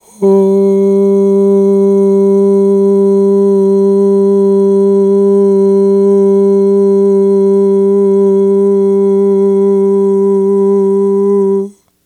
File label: AHHH, Vocal; Polyend